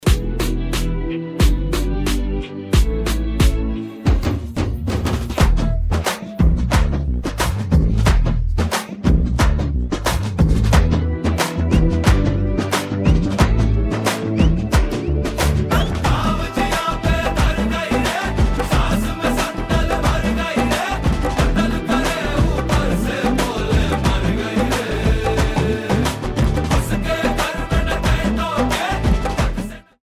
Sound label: Karaoke Song